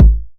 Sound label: 909 BD; Goldbaby Tape808 & Tape909; The Tape 909